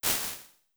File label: Bright Plate, impulse responses, plate reverbs; Small Spaces; Apple